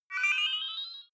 game start

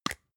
spice jar smooth open; industrie wirtschaft; gastronomie

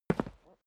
step boots single concrete single stair down; Footsteps; schritte

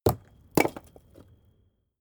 stone large fall short; natur-tiere; steine

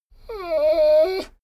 dogo argentino whine desperate; natur-tiere; hunde

